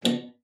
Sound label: PLOK A2, spinet; Xsample VOL-7